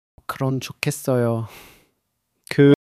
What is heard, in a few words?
struggle